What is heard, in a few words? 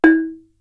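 percussion E3, Gamelan, KORG DSS-1; ProSamples vol.55 - Retro Sampler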